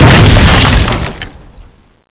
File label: iceclub exp; Frozen Terror